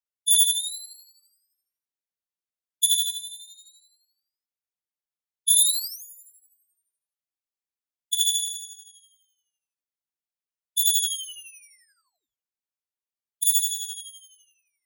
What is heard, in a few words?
BLING Bell Modulation; boom library; Anime Essentials